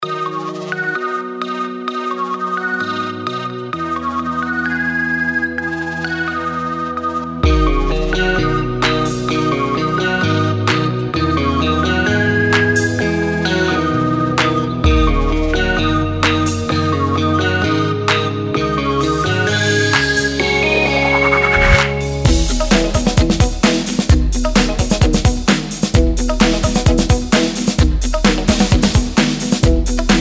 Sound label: ENGLISH POPULAR SONG